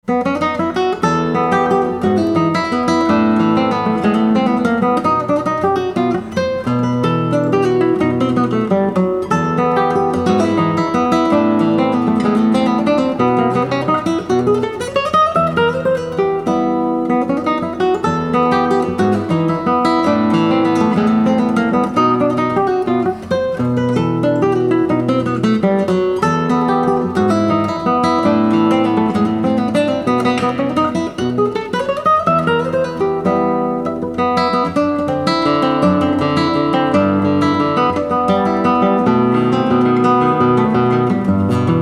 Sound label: Venezuelan Waltz, Latin Venezuela, Classical Guitar